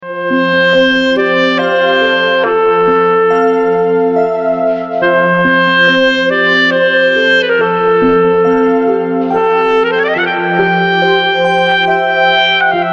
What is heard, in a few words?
Silent night Loop